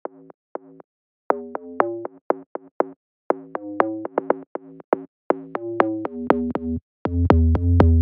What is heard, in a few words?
synth; monosounds